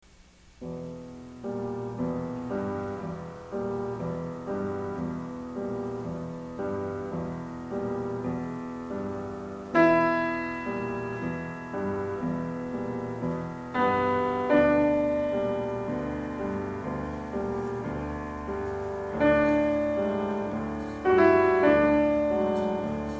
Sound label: Prelude in A Minor Lento